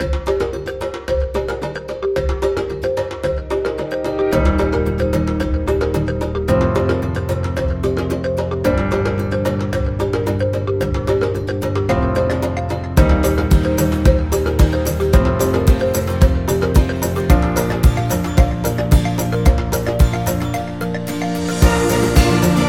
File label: African Music Background